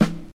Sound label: fresh tight acoustic snare sound g key, acoustic snare sample tuned to the G note; snare drum samples